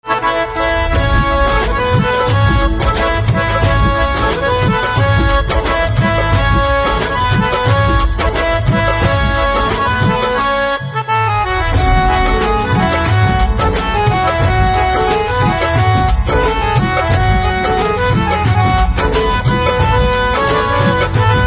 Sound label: Harmonium